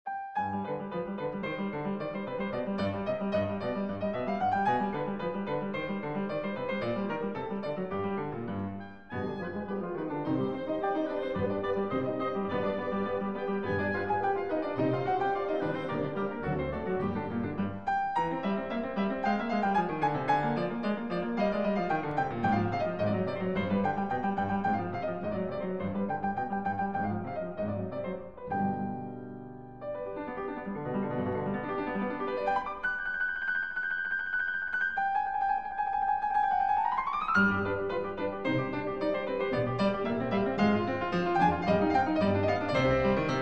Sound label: Piano Concerto in C Minor, Rondo - Allegro; Piano Concerto No. 3; Op. 37